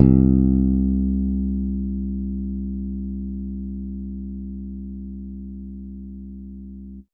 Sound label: Bass Guitar C